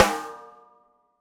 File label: snare, gretsch kit